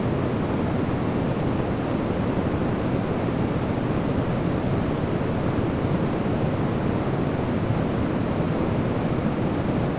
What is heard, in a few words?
Pink Filtered